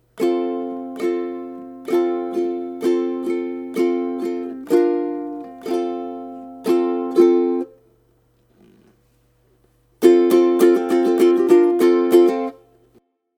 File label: Carrot Strum, ukulele